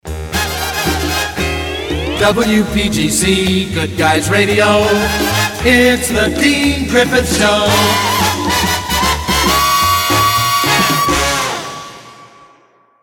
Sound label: jingles; pams; series 27 jet set